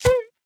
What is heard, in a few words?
hit, thorns; minecraft; enchant